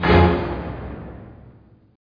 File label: Orch Hit